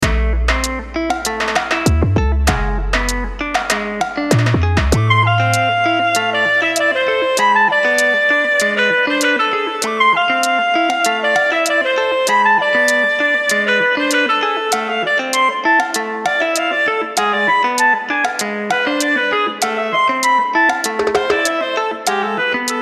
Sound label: Instrumentals